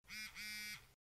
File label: iPhone Notification Vibrate; Tik Tok